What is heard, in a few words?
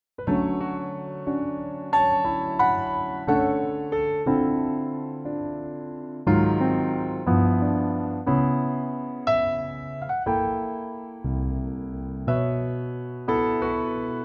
A Piano; key - A - vocal range - (optional E) F# to Ab